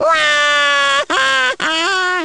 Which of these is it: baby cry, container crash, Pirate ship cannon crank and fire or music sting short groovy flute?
baby cry